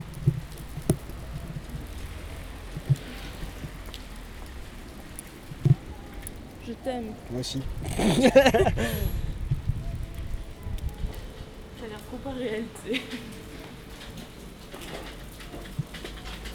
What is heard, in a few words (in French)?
Entré Leclerc pluie; Ville; ZoomH2N